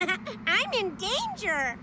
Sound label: haha